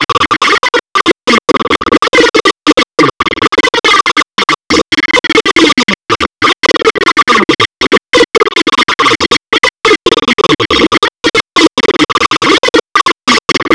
weird loop that can be perc; random and out of place

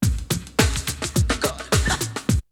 Drumloop; ZERO G - Datafile DISC 3

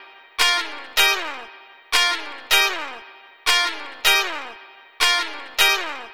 Gt Chikz A#; Lounge; Zero-G Kocktail Kollection; Construction Kitz; Flutes & Swingers 156; 90 s Sample CDs

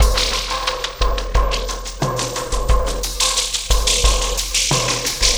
Ripple Perc

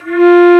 Flute p F2; Digital Sound Factory Virtuoso

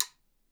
HIT, Percussion; Miroslav Vitous - Percussion & Harp

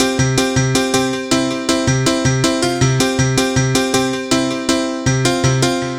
piano loops, Hardcore Loops; Dance Synths

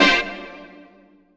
STAB

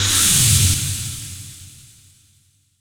SPACE-FX

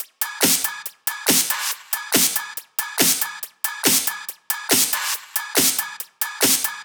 drum Loop 140BPM; Vengeance - Essential Clubsounds Vol.5; VEC5 Drumloops; VEC5 Multi Loops 140BPM

